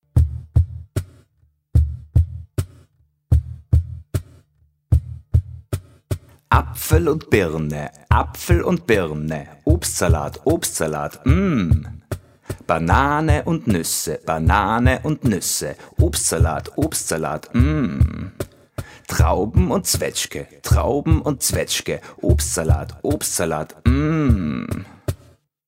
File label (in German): Rap Obstsalat